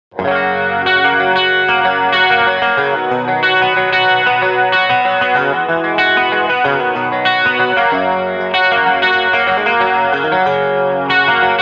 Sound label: Intro Guitar